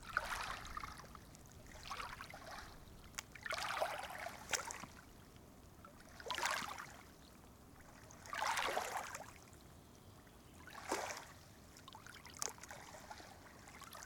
Quiet Surf On The Lake

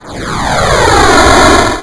8 Bit Flyby

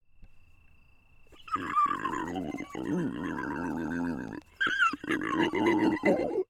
Pig Scream

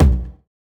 Phat 909 room tom

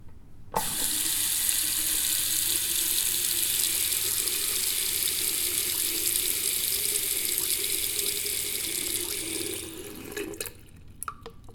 Bathroom Tap

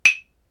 Clave Single Hit

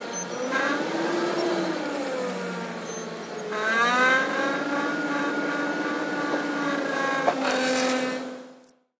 Forklift Noise

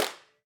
Sweet Claps

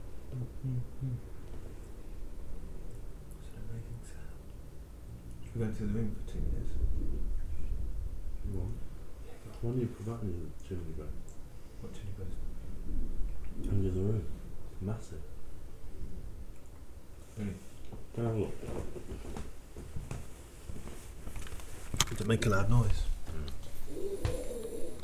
Murmers And Movement

